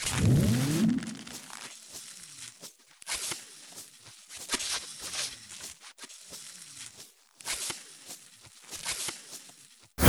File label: using med kit; cstrike; pubg